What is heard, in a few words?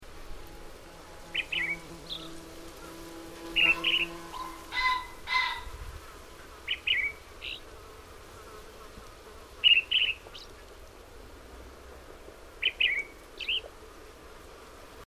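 Turdus feae, Kiina; May 2002 Lao Ling, near Qinghuangdao, Hebei, China.; Linnut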